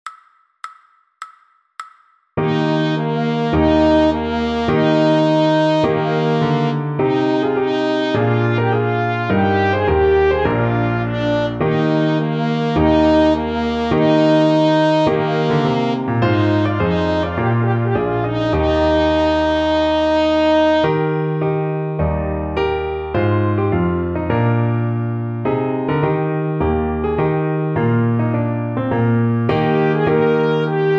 trumpet fast; Trumpet Tune

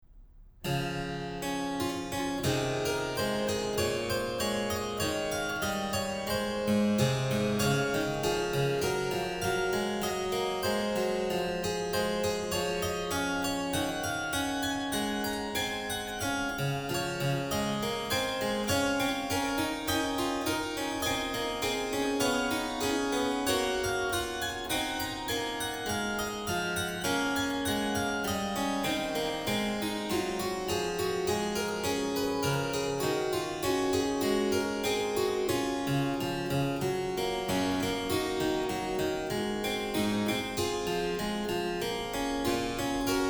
Harpsichord; Bach; Prelude in D; BWV 925

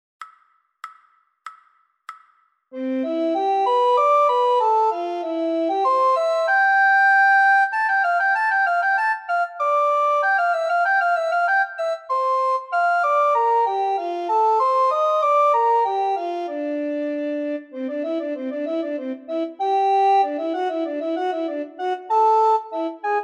descant, recorder

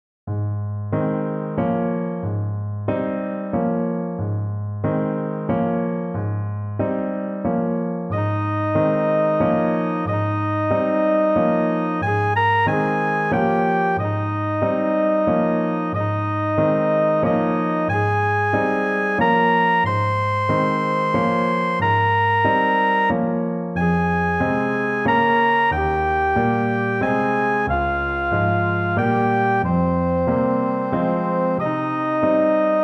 trumpet

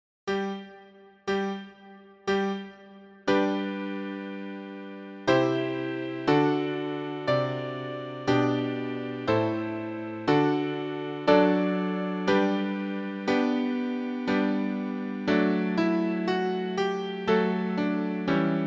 Largo piano

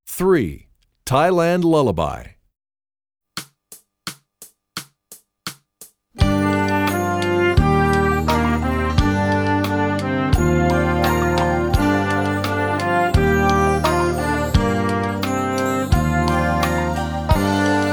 Thailand Lullaby, trombone; essential elements

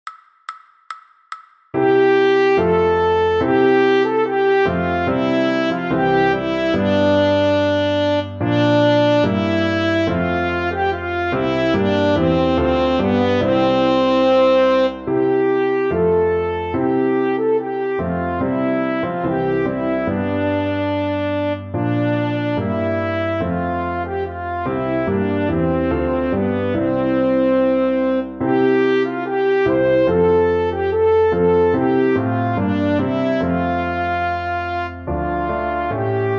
trumpet

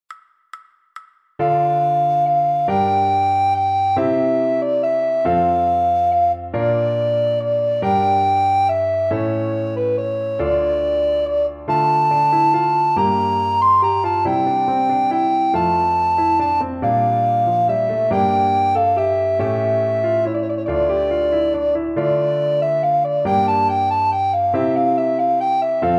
Division Upon an Italian Ground - fast, recorder

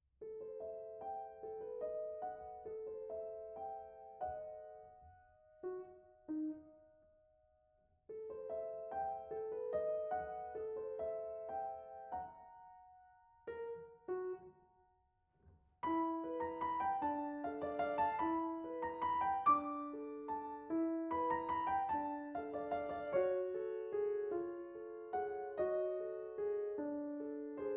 cuckoo fairy, piano

